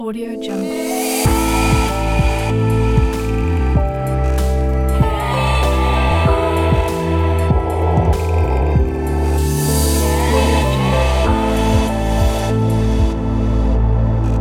ambient vocal logo

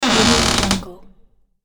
door creak

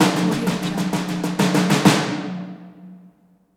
drums transition

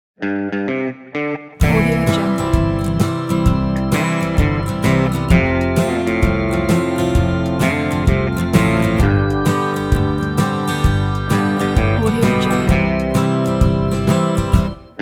laid back country band

